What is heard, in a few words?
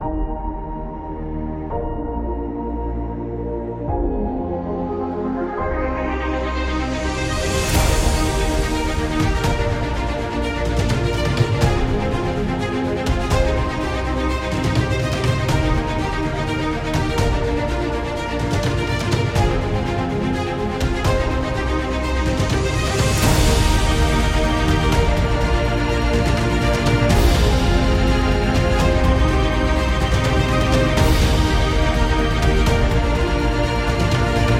Innovation Cinematic, corporate music; corporate music vol 20